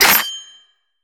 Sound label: slash